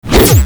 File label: Blaster Punch Swing; Warframe; Tenno